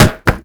car tire bounce; Call of Duty Modern Warfare; physics

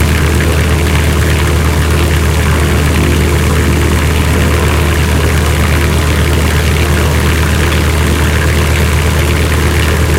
mustang side, engines; War Thunder

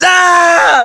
death

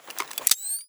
thermal activate; Escape from Tarkov

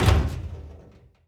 Fairing Door Open, Aircrafts; DCS

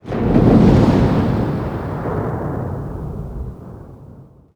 hit echo

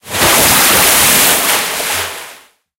enter the water, tanks; engines; War Thunder

